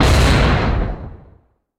Explosion; Boomerang Fu

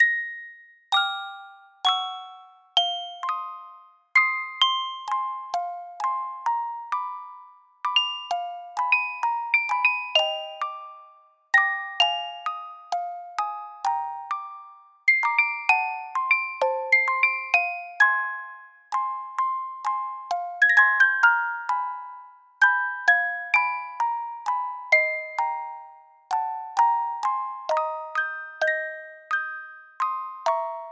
Moody (Music Box); animal crossing new leaf welcome aamiibo soundtrack

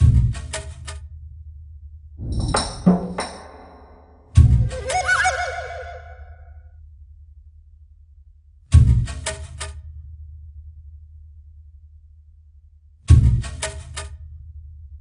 Jungle Level

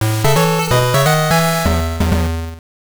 Round Clear; Bust a Move 2 Arcade Edition; Game Boy

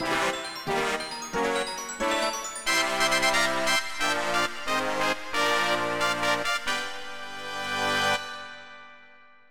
Jingles

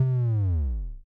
Panel Reactor Manifold Fail; Among Us

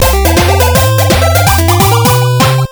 Puzzle Completed!; Family Picross; NES homebrew

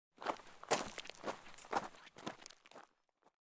footsteps gravel walk short; The 39 Steps

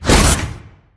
Pause Screen Door Out; Angry Birds Star Wars 2

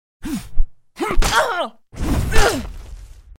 Slash Double; Goddess of Trampling

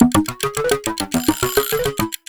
new record loop; Fly O'Clock

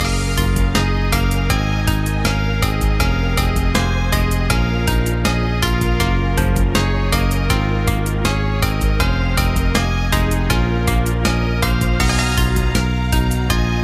BGM Opening

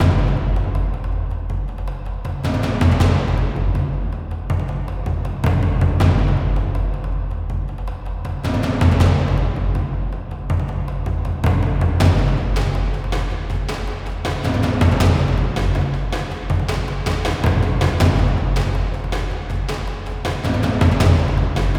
Battle Warrior Drums; rambo the video game 2014

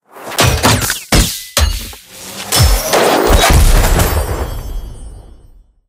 Epic Chest

